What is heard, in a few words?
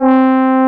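FM FRENCH HORN C4; AKAI S-Series CD-ROM Sound Library VOL-2; HORNS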